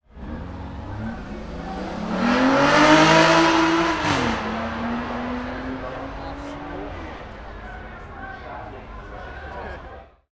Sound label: Aston Martin Rapide